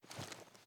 reload magin cloth; reloads; gmod; iw8

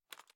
kilo98 inspect; viper

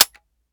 weap fire first; gmod; weapons

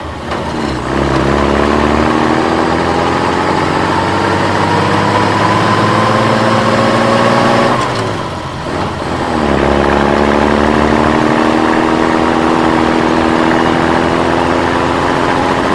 throttle 2nd, vehicles

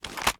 raise quick up; reloads; gmod

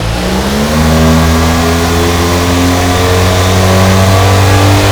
v8 turbo on loop; commoncar

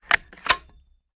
reload empty bolt open; reloads; gmod

